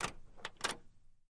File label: Camaro Door Open Ligh, chevy camaro